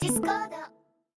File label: DISCORDA